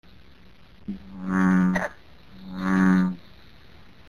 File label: cowfrog